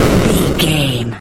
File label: retro explosion general